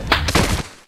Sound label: slap; Bitch slap